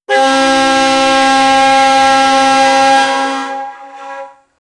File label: horn of narrow gauge dies; diesel